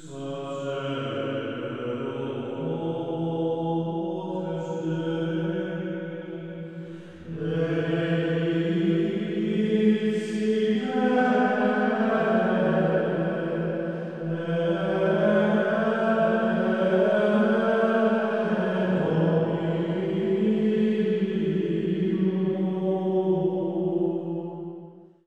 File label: Chanting Example